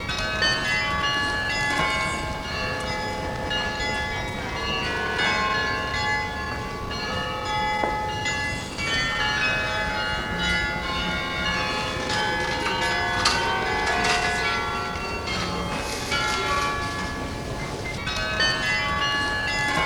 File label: Church Bells in Gent in Belgium